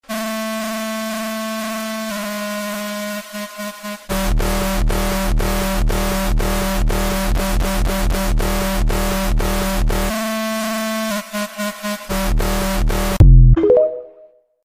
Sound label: downtempo